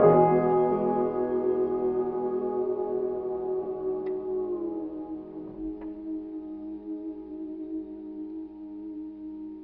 piano voice in mourning, sad loop; Loops

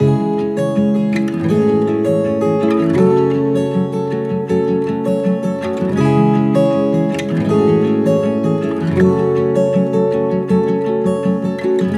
guitar

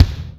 Kicks Loud

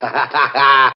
laugh

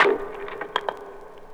firewood; Drum loops

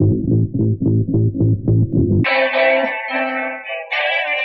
guitar honky hoedown, loops; Phonky

